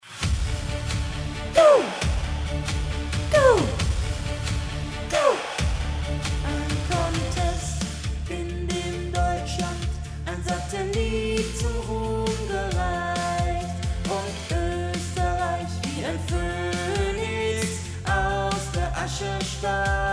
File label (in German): Sopran